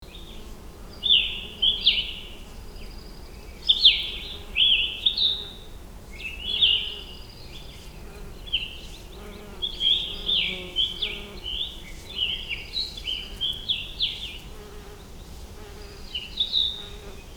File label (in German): weinberg sound, Weinberge als Lebensraum; Biodiversität; Ethos - Wein in Franken